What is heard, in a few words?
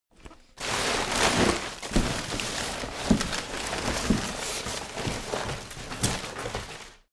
recycle bin search